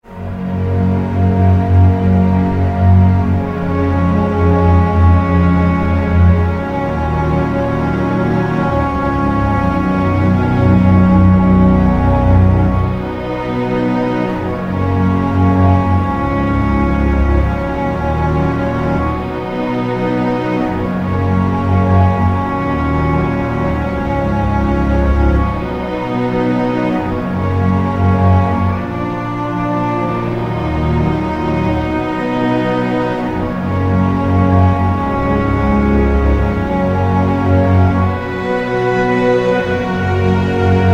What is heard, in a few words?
Underscore PAD; Worship Flow